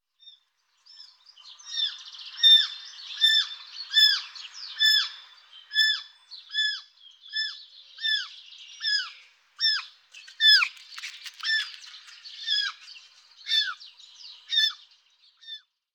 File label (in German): reh kitz; vogelstimmen